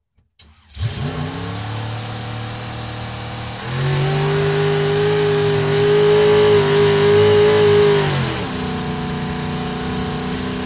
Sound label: Boat In Out, motorboats